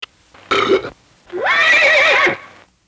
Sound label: Belchin Whinny